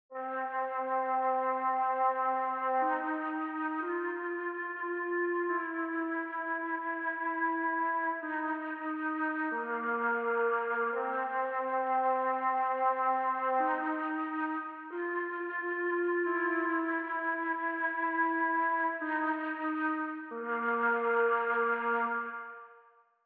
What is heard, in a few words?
Mellotron Flutes